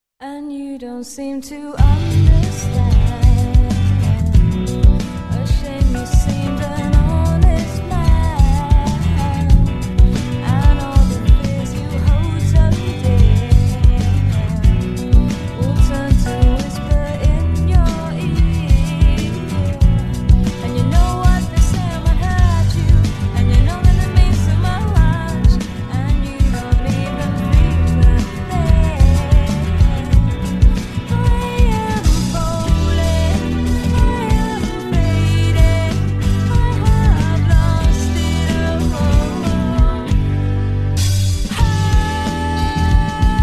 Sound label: opening bassboosted gain 30dB; Serial Experiment Lain